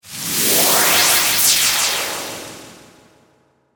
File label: Space Whoosh Ascend, Descend; Science, Fiction; BlastWave